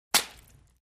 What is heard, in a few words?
Juicy Impact